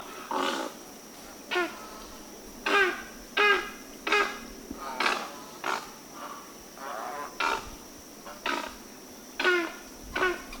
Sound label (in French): Grande Aigrette